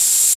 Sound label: FX ANALOG; Sound & Vision - Gigapack II